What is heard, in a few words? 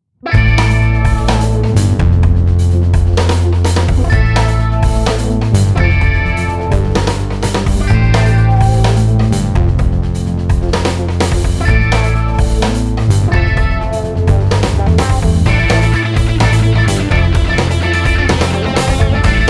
Pop